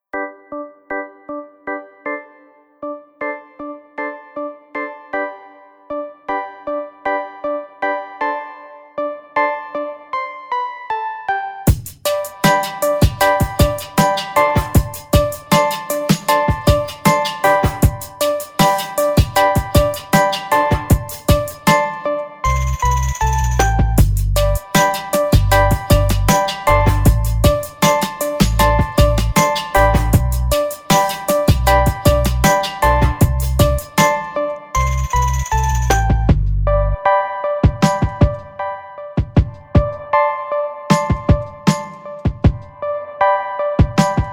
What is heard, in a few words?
Bake Sale, G, 156bpm